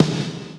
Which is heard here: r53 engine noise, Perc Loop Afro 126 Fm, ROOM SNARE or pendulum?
ROOM SNARE